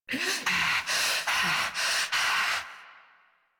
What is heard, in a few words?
Breath Heavy Fast; New War